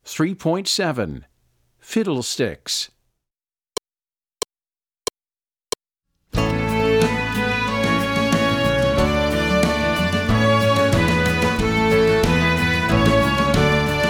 Fiddle Sticks

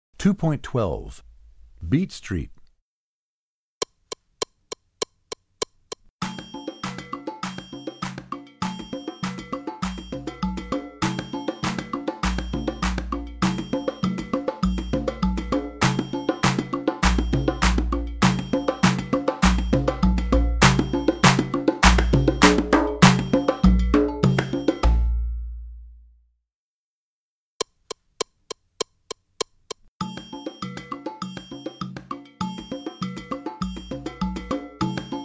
Beat Street, Percussion; Percussion Book 1

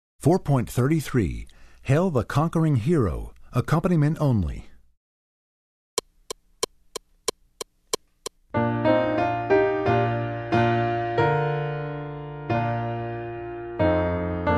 Piano; Hail the Conquering Hero

